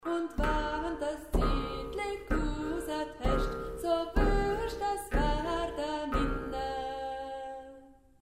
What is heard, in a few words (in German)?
Truempi Klang